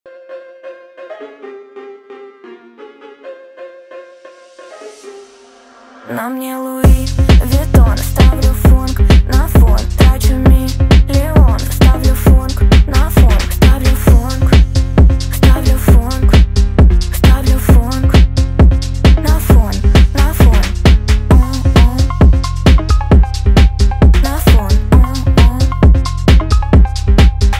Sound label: Phonk